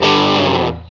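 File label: GTR CRUNCH, Metal; Power Chds; Club-50 - Foundations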